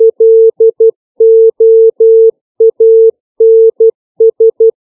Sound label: five common words, CW